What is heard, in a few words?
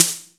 909 SNARE EQ; Kid Nepro; Elite